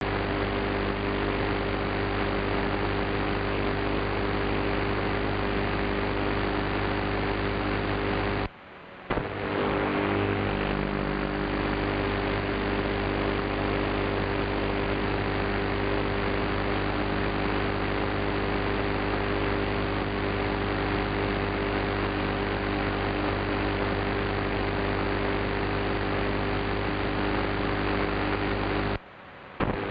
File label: APC UPS QRM 340kHz